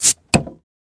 Bottled Drink Open; eating & drinking

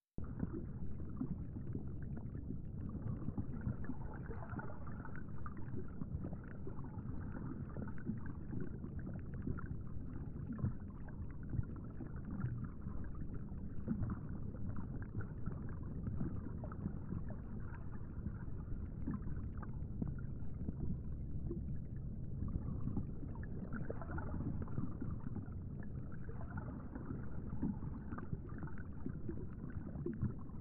Underwater Water Flo, ambience

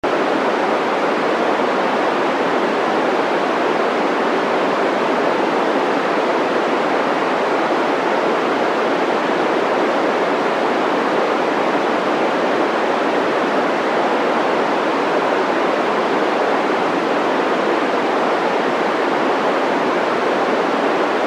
Static Soft VHF FM Static

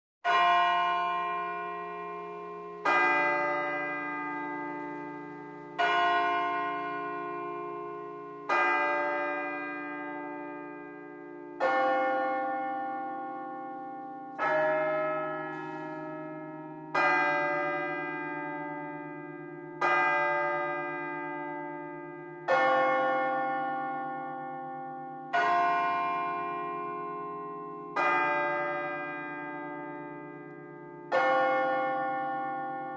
Bell Tower Close Pers; bell towers

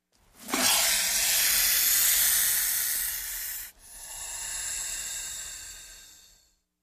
Snake Hiss, Breath

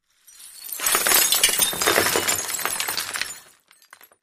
Glass Falls Medium; windows & mirrors

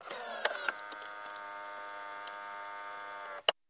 Door Inner Open Tape, dat machine

